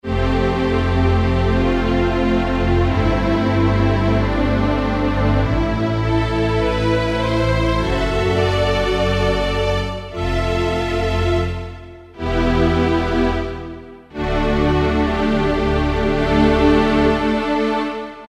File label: N-Strings, Korg N364; Factory Prog demos